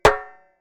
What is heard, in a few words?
Footsteps on metal